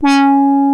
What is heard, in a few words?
ANALOG COMBO; OMI - Universe of Sounds